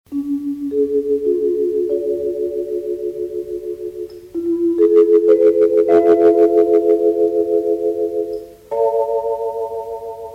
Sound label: vibraphone vibes; percussion instruments